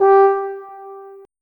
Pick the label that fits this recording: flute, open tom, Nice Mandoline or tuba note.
tuba note